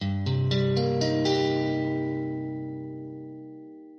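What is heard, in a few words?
C min, Guitar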